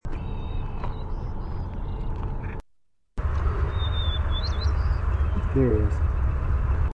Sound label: song